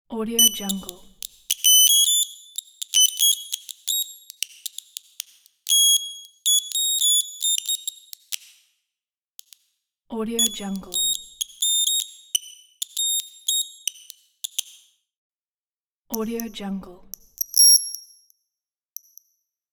bleeps glitch user interface

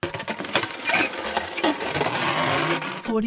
metal scrap move

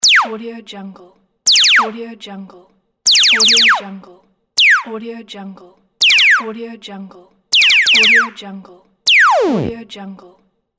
laser

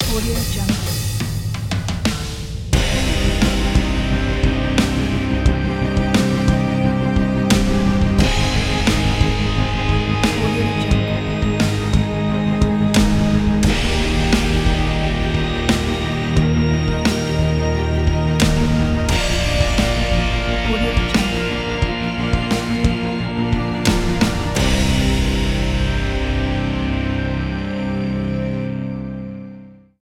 cinematic trailer ident; isakukageyama

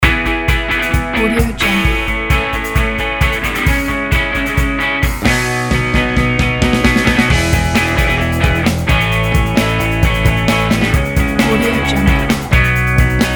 hopeful indie rock

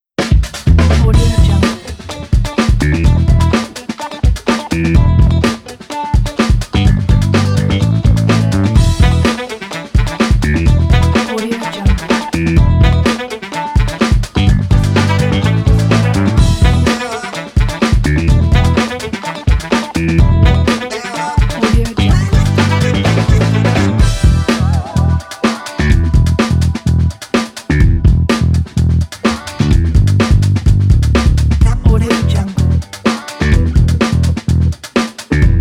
groove show

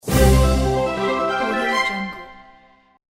big win

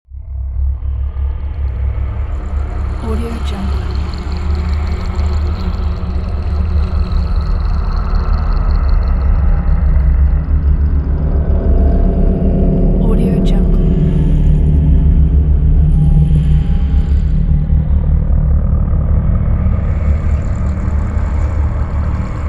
atmo drone bowels; smartsoundfx

